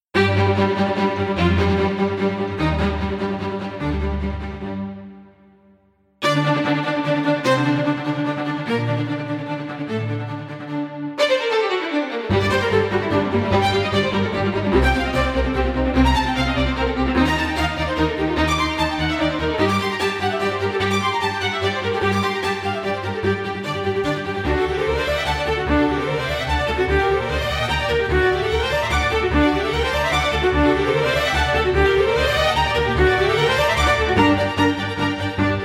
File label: Presto Orch; Createc